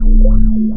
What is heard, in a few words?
portal; spiritheroes